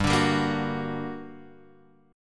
G Minor Major 7th, down, Guitar, Open F; GmM7 strummed; JGuitar

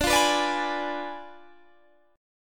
D Diminished 7th D down Guitar Standard; Ddim7 strummed; JGuitar

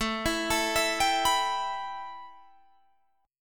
A 7th Suspended 2nd A, Guitar DADGAD; A7sus2 arpeggiated; 19,19,19,21,22,21

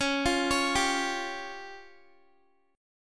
Db Major Flat 5th, Guitar; arpeggiated

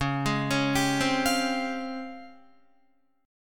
Db Major Sharp 11th, Guitar; arpeggiated; JGuitar

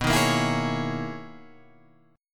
B Major 13th B Guitar Half Step Down

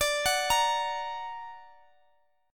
D Augmented D

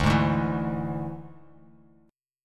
D Suspended 2nd Flat 5th D Bass Standard; 10,11,0,9; strummed

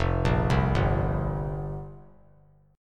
F Suspended 2nd Suspended 4th F down; arpeggiated; JGuitar

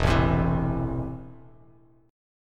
E Suspended 4th Sharp 5th, Bass Standard; strummed; JGuitar

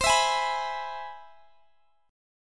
C Augmented Major 7th, Guitar Standard, chord; strummed; x,x,22,21,21,19; JGuitar

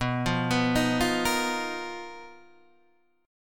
B Major 7th Flat 5th B down Guitar Open D

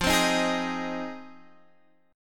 F Minor 7th F Guitar Half Step Down

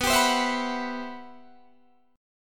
C-Major Sharp 11th B, guitar

